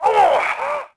Hit; Star Wars Knights Of The Old Republic II; XBOX

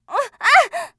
hurt; TrueCrimeStreetsOfLA; XBOX

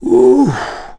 sigh; Cars

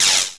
lightsaber hit

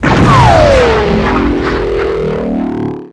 Prop shield Gen destruction; Star Wars Clone Wars; XBOX; Xbox Live Effects

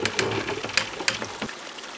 waterwheel; Ambient; MX vs ATV Unleashed

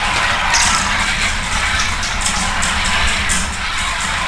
slide wire, terrains; Tony Hawk's Project 8; XBOX

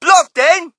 crowd, shout; Mike Tyson Heavyweight Boxing

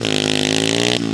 engine; MX Unleashed; XBOX

